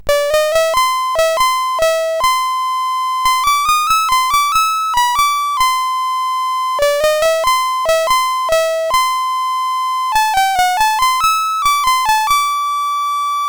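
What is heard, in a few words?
Poppem, MOS 8580 SID chip music, games; CSG8580R5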